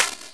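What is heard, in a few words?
fruit pick up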